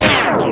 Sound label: scratch Dwn, Yamaha Motif ES; Yamaha Motif ES-Series